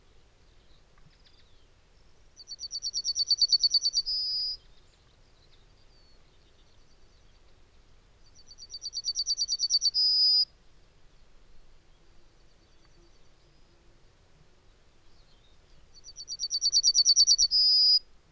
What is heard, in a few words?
Yellowhammer Emberiza citrinella citrinella